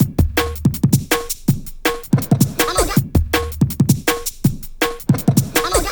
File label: 162, breaks, Loops; M8